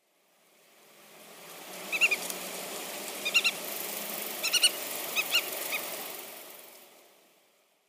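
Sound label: black-tailed godwit, watari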